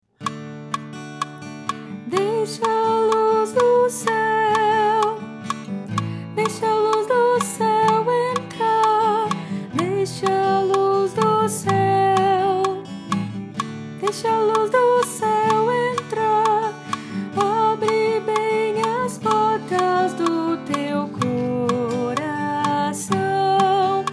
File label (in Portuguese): Deixa a luz do céu entrar soprano